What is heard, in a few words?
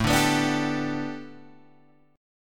A 9th Suspended 4th, Guitar Open G; chord